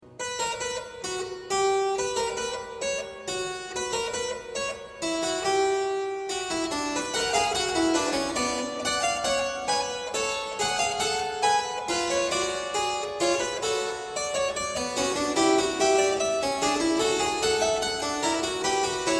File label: Bach Fugue in C minor; book1